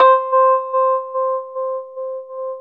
Chor Rhodes, C; E-MU Producer Series Vol. 5 – 3-D Audio Collection; 3DRhodes; 3DChorRhodes1.5

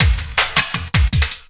Loop, Drum & Bass, 160 BPM

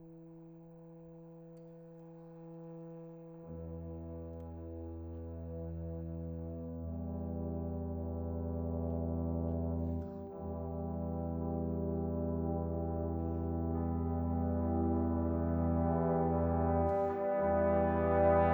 Brass

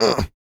A YELL, MALE HUMAN; ILIO; Vocal Planet

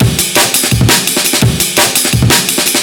amen 170; Amen Breaks

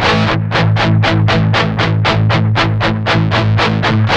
8TH A#2, Guitars; Spectrasonics; Hans Zimmer Guitars VOL-1; 128-JACKHAMR

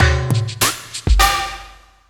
HALT LP, Industrial Loops; 98 HALT

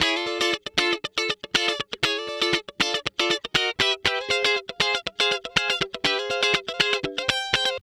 Guitar Licks

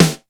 SNAREDRUM, D110 DRUMS; Crypton - DrumBase5000

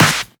Snares; Vengeance - Essential Clubsounds Vol.4

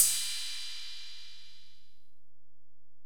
Ride, Alesis DM-PRO, Drum Machines

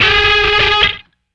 SHRIEK, guitar; Spectrasonics; Bizarre Guitar

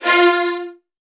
F1, VN 16TH, Virtuoso Strings; ILIO; Kirk Hunter

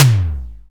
TOM A4; Greytsounds VOL-1; SDX TOMS

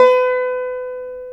veena B5; Spectrasonics; Heart of Asia

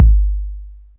808 LNG KICK; AKAI S-Series CD-ROM Sound Library VOL-4

